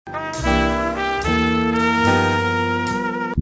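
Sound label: Jazz, drum machine; The Netherlands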